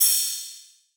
Ride, Afrobeats Drum Kit; Drum One Shots; Cymatics; PARADOX II

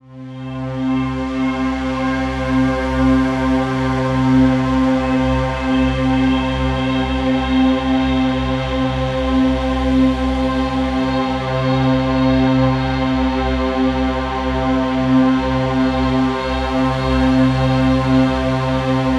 Atmosphere

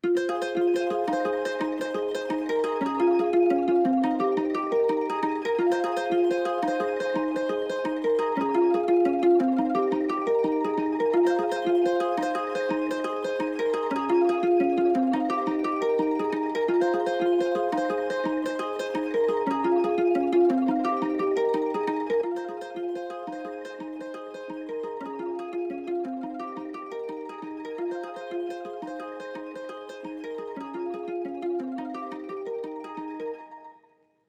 173 BPM F Min Guitar; Trap; Loop Stems; Empire

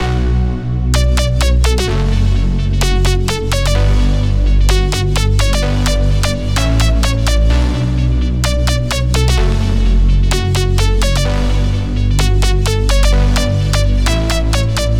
128 BPM B Min, house breakdown loops; Believer; Cymatics

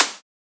Snare - Rattle, Psytrance; Snares

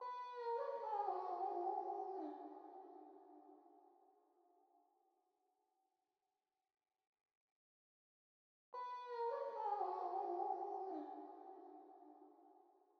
vocals, 110 BPM D# Min; Cymatics; Oxytocine

